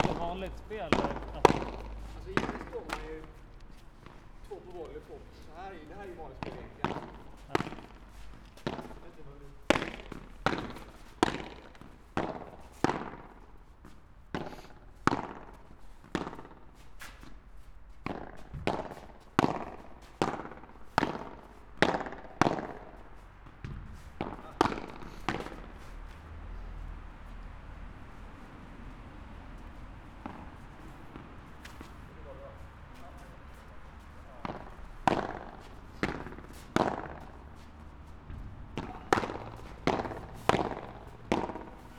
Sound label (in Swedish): Padel matchspel; Kandidatgruppen